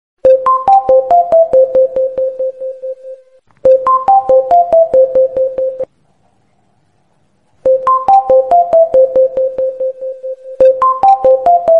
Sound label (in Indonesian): nada dering Bgm theme; Preman Pensiun